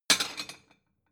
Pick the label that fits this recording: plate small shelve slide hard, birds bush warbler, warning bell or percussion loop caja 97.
plate small shelve slide hard